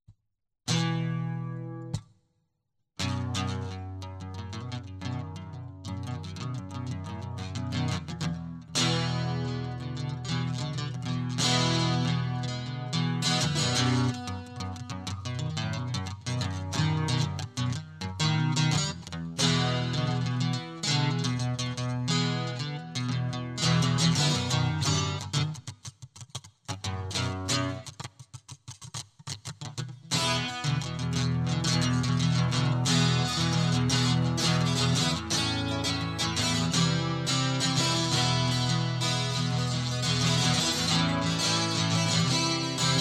Soundcheck

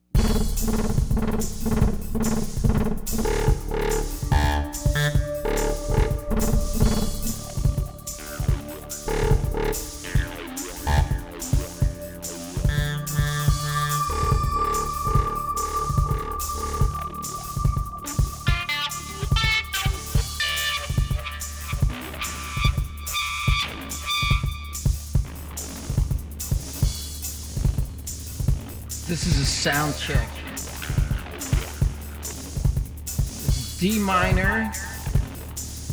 Soundcheck

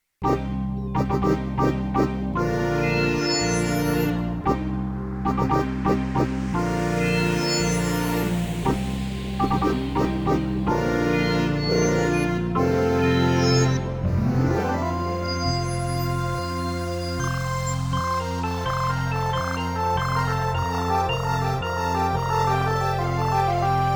space prelude

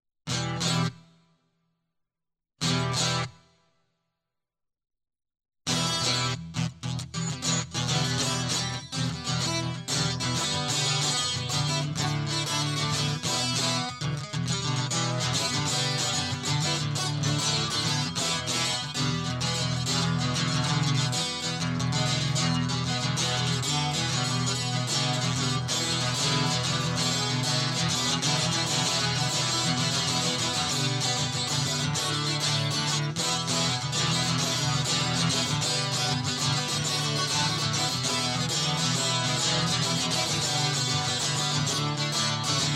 Guitar Loop

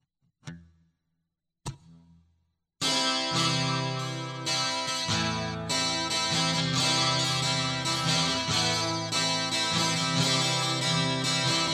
Guitar Loop

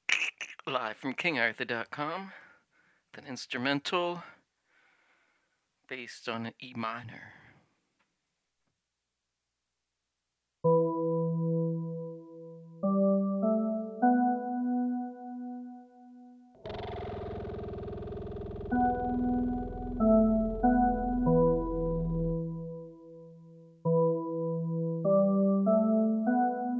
Instrumental Soundcheck

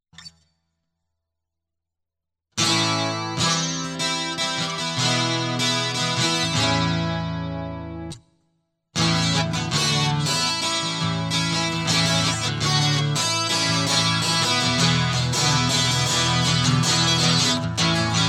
Guitar Loop

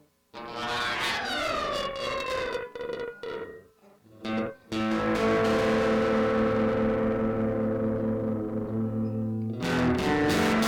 organ guitar duet prelude

